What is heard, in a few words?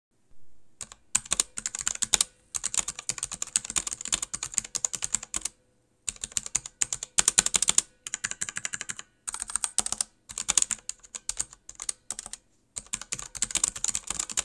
corsair k60 rgb pro